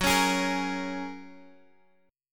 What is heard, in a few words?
Gb Major Add 9th, down, Guitar, DADGAD; Gbadd9 strummed